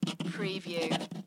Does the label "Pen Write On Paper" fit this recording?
Yes